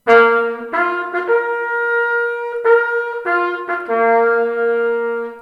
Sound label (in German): rechter fluegel, jagd